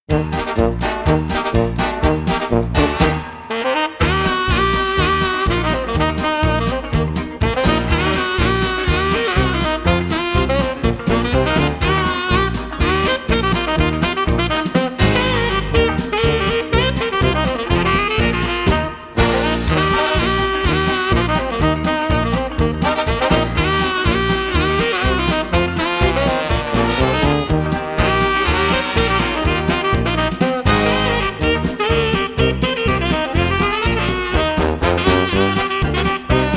Whacky Sax; Network Production Music; NM095 Clownin' Around (Specialty)

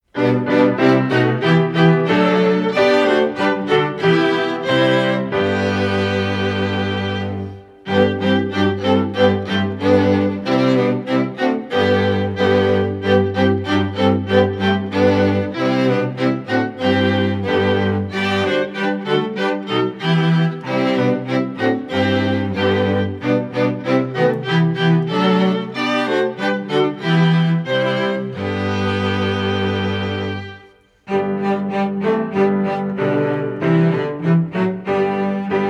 Good King Wenceslas Strings Version; Network Production Music; NM300 Traditional Christmas

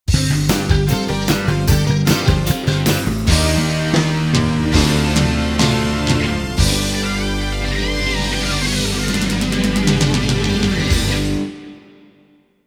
Fanfare; Network Production Music; NM101 Ain't No Stoppin' Us (Multimedia)

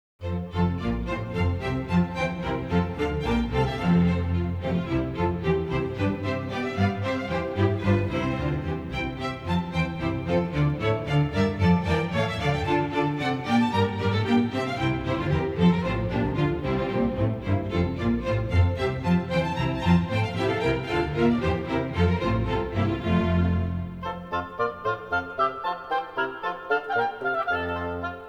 Bourrée; Water Music; Network Production Music

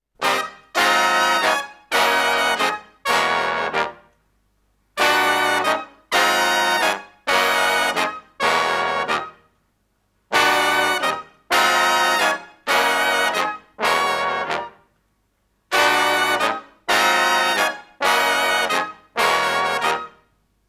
Cut To Size (Brass); KPM 1000 series; Flamboyant Themes Vol.2

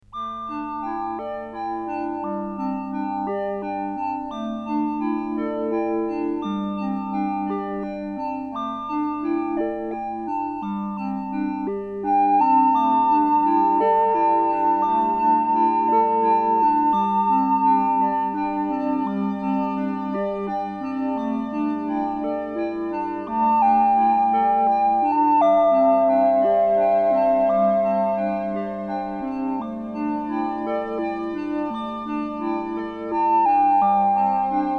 soft waves; parry music library; video-tech 5

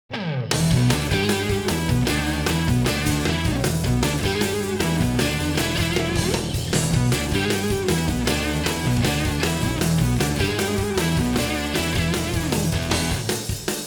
Guitar Haze; Network Production Music

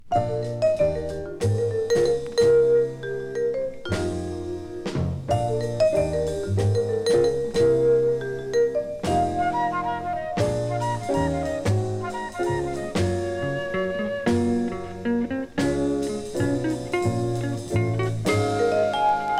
Modern Jazz Septet Prelude; KPM 1000 series

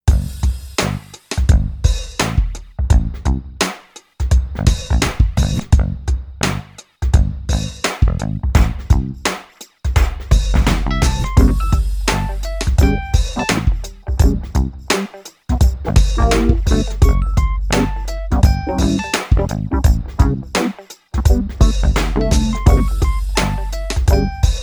Simple Funk Loop; Network Production Music; NM288 Tradeshows

